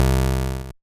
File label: Buzz; Bitkits